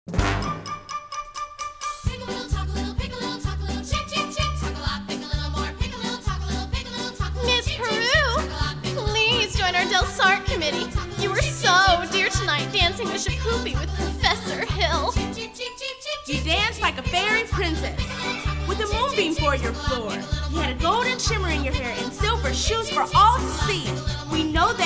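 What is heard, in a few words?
With Vocals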